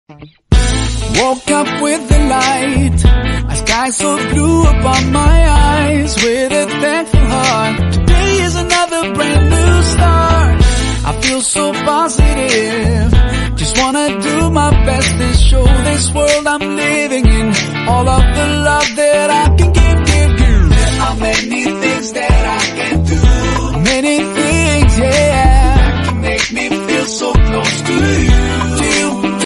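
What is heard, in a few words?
english islamic ringtone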